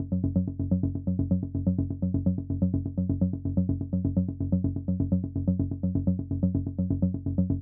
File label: 126BPM Seq Root F#; Vengeance Samples; Malta Kit